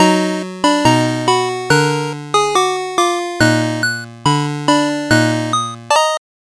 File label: gameover